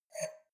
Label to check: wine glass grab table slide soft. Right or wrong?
Right